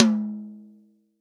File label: TOM, Real Drum Kit, POP KIT